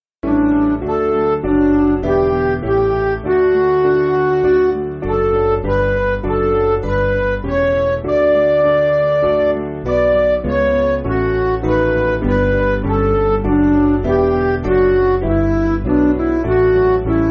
Midi, Music Backing

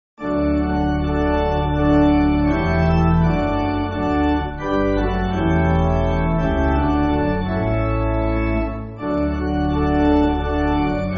Pipe; Lutteroth; H. Lutteroth 8.7.8.7.D

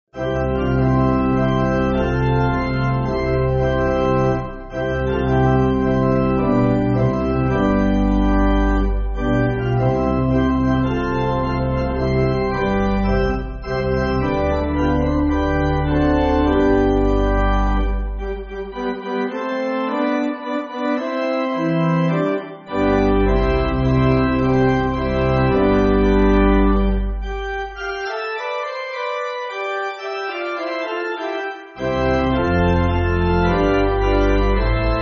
Pipe Organ, Backing Files for Churches; Our Life Is Passing; Sunday School

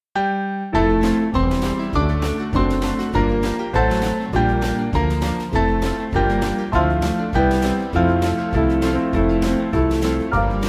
O Who Shall Roll, New England, Band

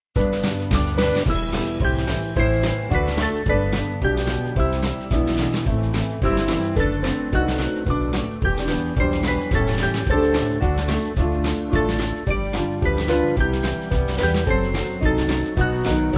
The Eternal Gifts, Gonfalon Royal, S Band

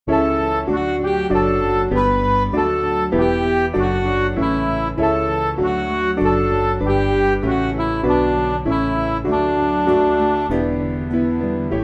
Come Holy Ghost, Midi

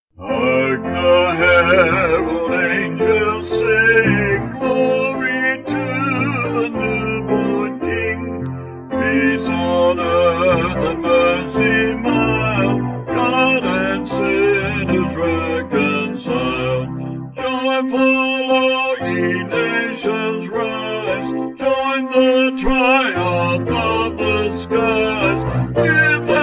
Vocals Piano

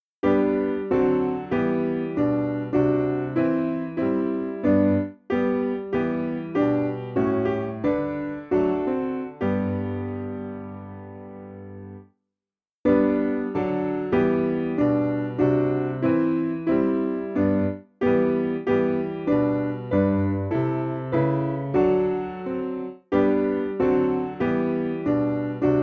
Jesus Tender Shepherd, Brocklesbury, Piano

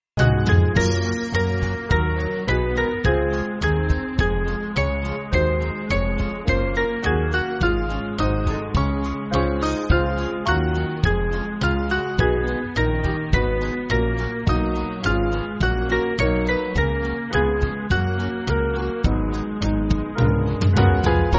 Band, Music Backing; Awake, my soul, and with the sun; Morning Hymn

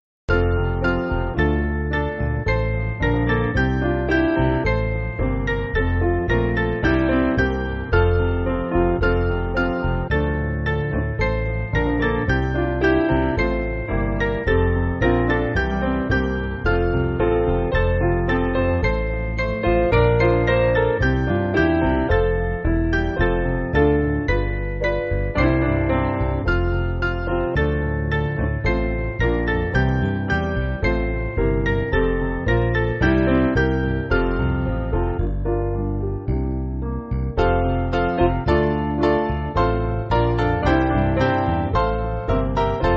Piano; The Chief Cornerstone; French Air

